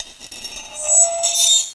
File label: scratch on glass